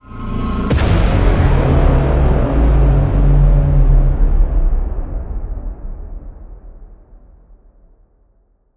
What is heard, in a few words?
Stinger Modulate